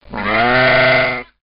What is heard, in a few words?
sheep